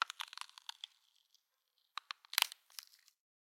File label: cracking